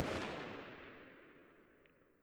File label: Fire; Weapon Package FortWars